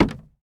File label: wood footstep